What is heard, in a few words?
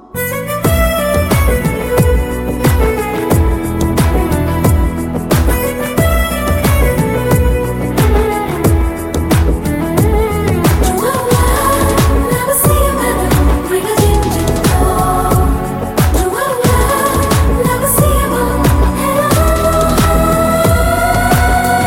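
background music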